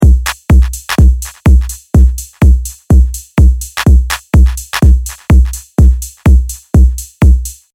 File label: beat